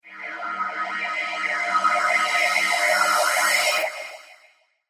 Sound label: Reverse Cymbal Phaser